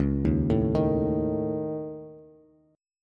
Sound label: Db Suspended 2nd Sharp 5th, Bass; arpeggiated; Dbsus2#5; JGuitar